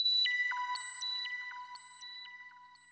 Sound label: BEL, Hip Hop and R&B Drumloops; Best Service; ProSamples vol.01; AKAI